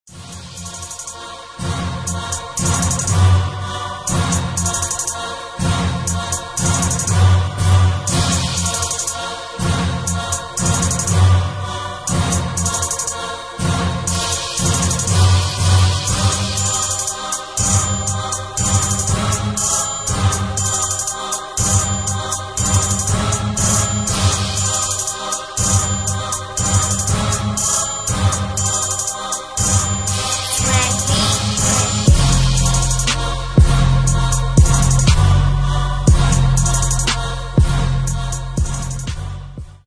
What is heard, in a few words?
instrumental 808 type beat